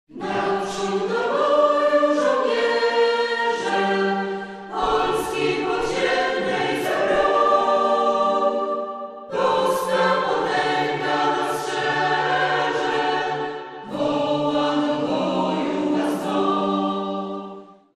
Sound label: acapp